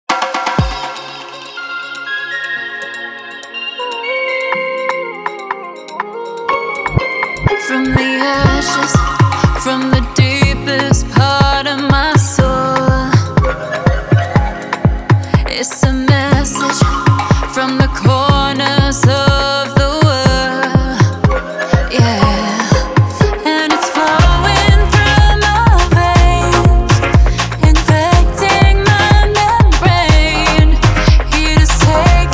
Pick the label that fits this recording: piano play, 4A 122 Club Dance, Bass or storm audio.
4A 122 Club Dance